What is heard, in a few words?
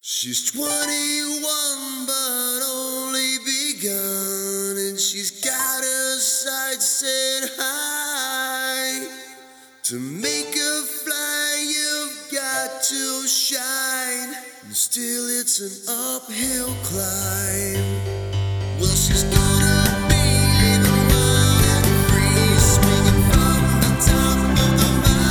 male keyboards bass